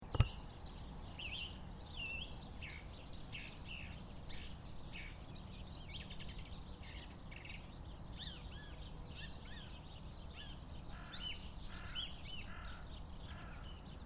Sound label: Marshes